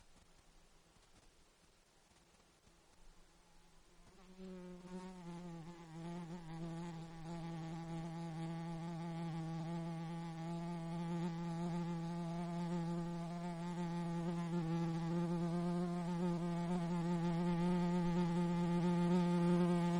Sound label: Mosquito at Microphone; Alaska; INSECTS